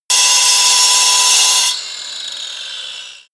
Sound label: grinder bright on load stop